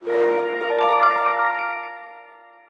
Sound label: completed; pipe mania